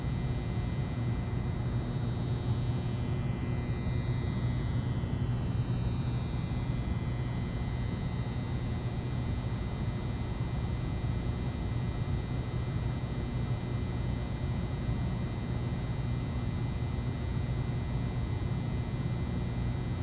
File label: Buzz; The Joy of Creation Halloween